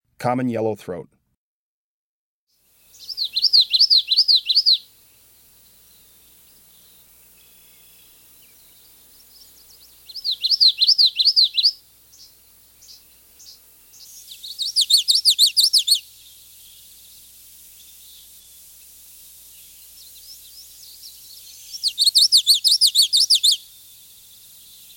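Common Yellowthroat, Breeding Birds of the Chicago Region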